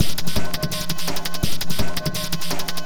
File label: LUNAR PHASE GROOVE UP 1 OCT; FRENETIC FRACTAL GROOVES